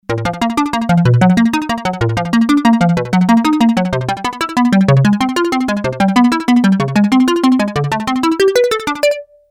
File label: bass; Creamware minimax; pacman